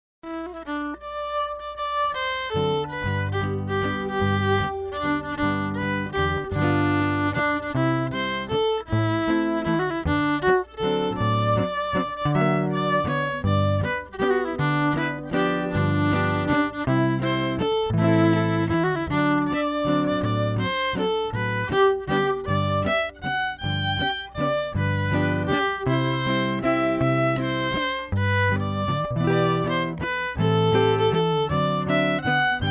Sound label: Waltz